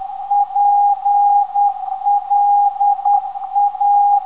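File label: 352 kHz, non-directional beacon; PLA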